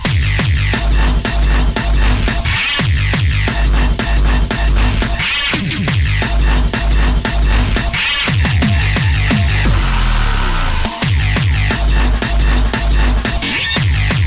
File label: Mega Hard Tune